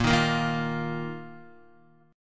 A sharp Suspended 4th A sharp, Guitar; strummed; JGuitar; x,1,3,3,3,1

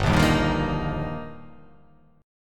G Minor 7th Sharp 5th; Gm7#5; strummed; JGuitar; 3,1,1,0,3,0,0,0

